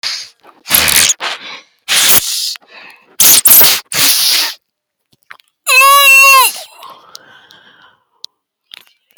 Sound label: sniffs